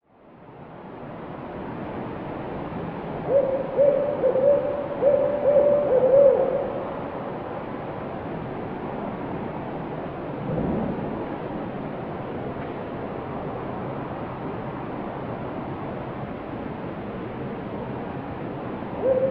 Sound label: barred owl